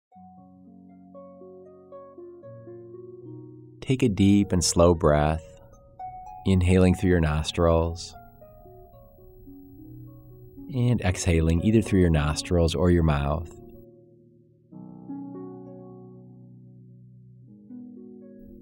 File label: breath awareness harp